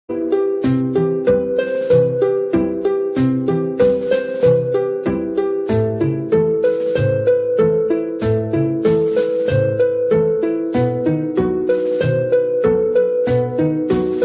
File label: Orchestral